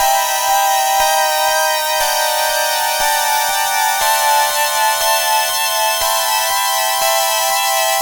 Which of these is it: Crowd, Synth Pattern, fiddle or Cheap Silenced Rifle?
Synth Pattern